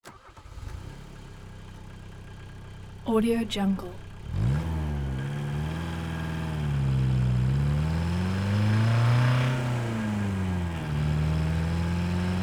volkswagen rabbit car 5 speed diesel

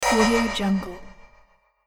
cymbal tremolo hit; sonic-boom

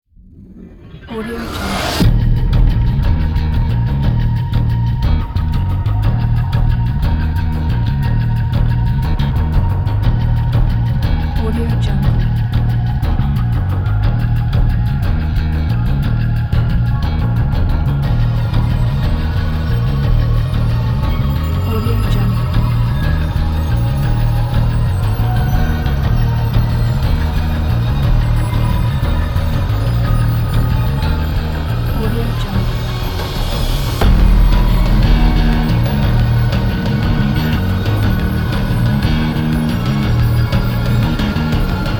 rock glitch

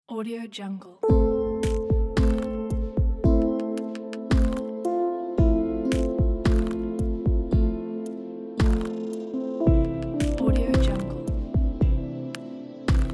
piano glitch

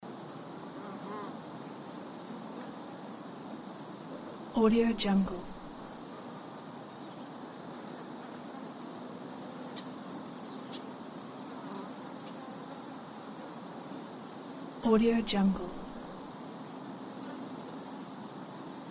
afternoon ambience with cicadas loop

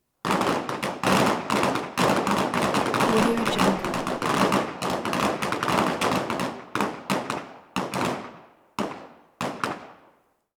shooting range

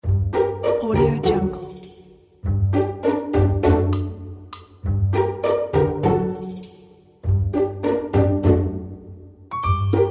it is sneaky

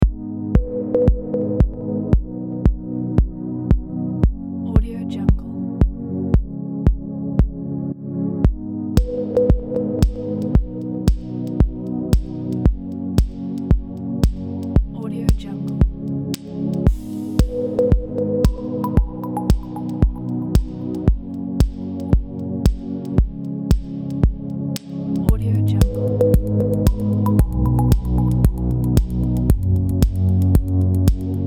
minimal lounge

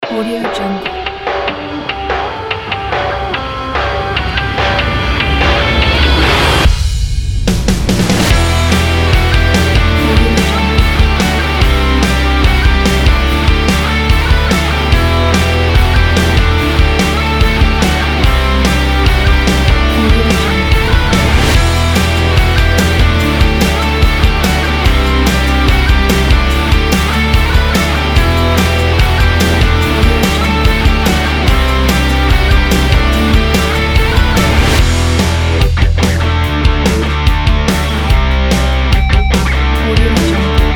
melodic fast rock

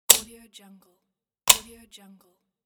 switch on off

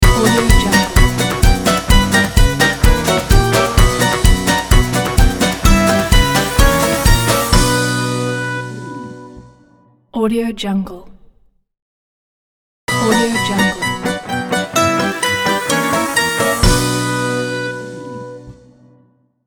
italian cooking ident logo

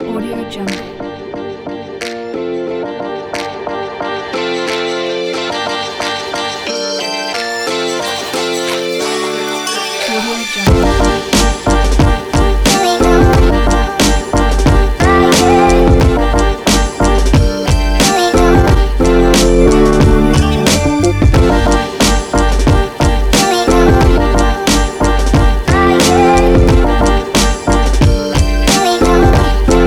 vlog

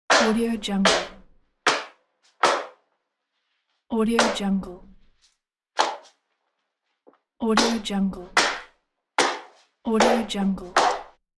knife cuts on the cutting board

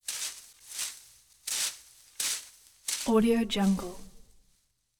rake leaves

